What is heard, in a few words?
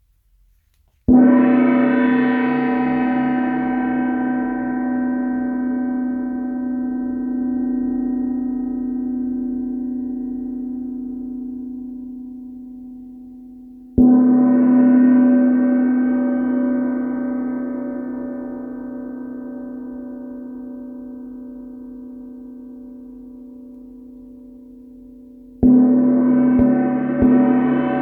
Gong